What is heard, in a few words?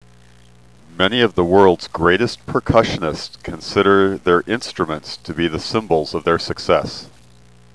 percussion